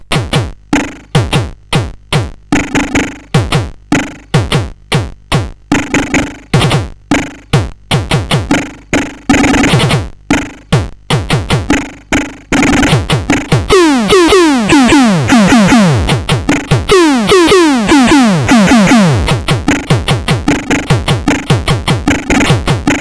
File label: Drum, CSG8580R5